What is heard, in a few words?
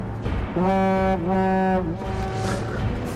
horn of gondor; Lotr